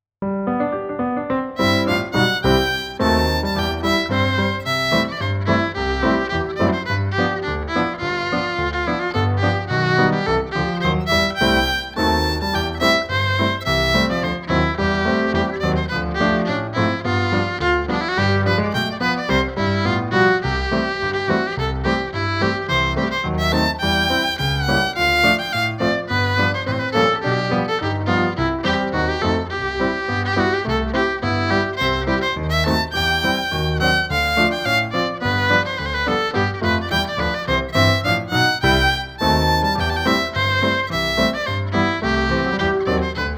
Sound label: Maple leaf Two step